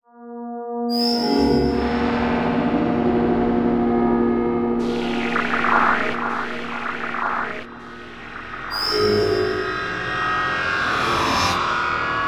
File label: Electronic Whoosh Digital Reverse Delay; Module Production Element Title Imaging